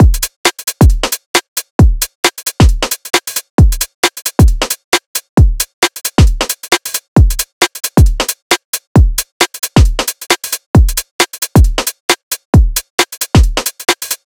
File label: Drum Loop 134 BPM, Garage; Drain